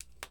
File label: Shutting Phone